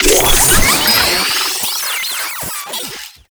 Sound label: Practice Orb Activate C; Warframe; The Teacher; Mod Quest